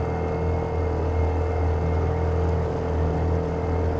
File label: Boat accelerate; UltimateFishing2020